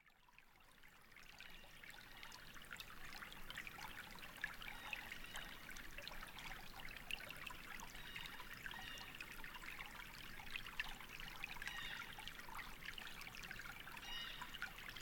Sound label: winter birdscape